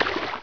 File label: water run; Player; Heretic II Demo